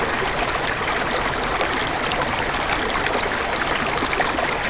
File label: water loop; autonomy lost